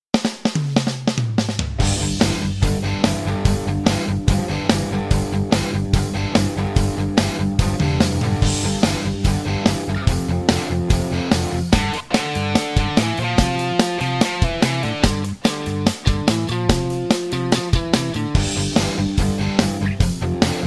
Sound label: karaoke instrumental; school of rock